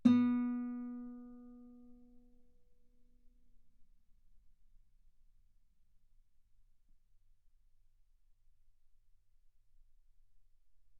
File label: Guitar B3; ff; sul B